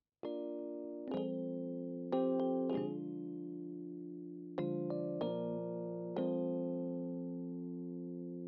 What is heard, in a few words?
Rhodes